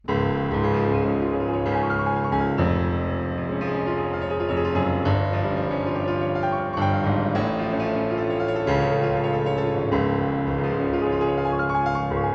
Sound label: piano; Bomblet